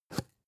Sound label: spice jar big scratch open fast